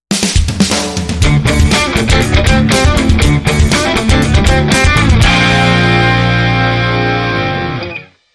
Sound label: Heavy Funk